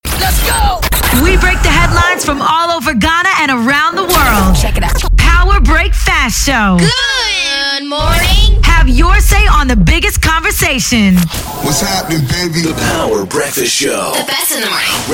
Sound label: BREAKFAST SHOW JINGLES